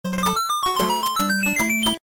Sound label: Glitch Extended 1up; SMB3